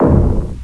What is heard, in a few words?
door stop; gearbox